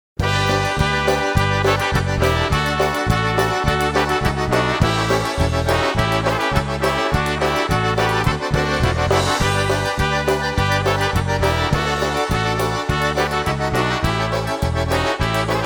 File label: Polka